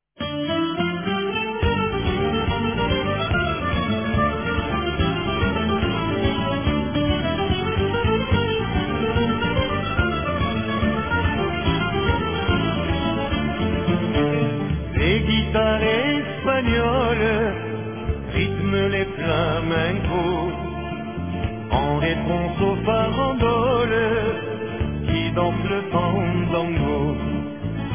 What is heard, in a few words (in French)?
jouez guitares